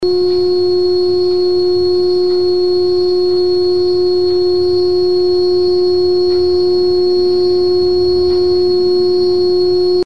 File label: 300hz Accutron